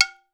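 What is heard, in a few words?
AFRICAN AGOGO; Voice Crystal - Steve Reid Definitive Percussion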